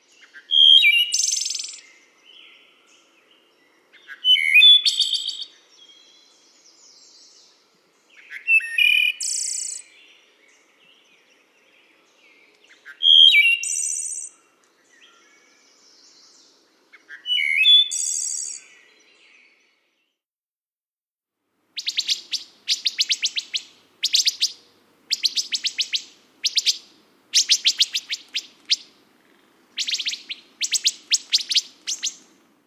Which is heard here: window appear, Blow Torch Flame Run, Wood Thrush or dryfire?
Wood Thrush